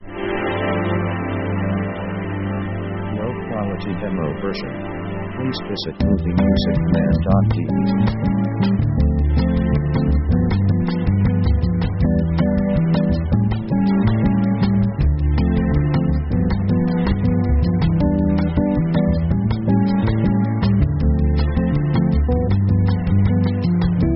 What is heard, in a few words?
jazz bossa; ethnic themes